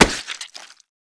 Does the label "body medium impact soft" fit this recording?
Yes